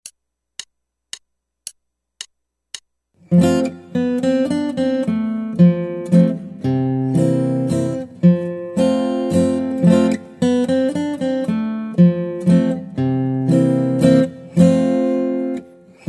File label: Guitar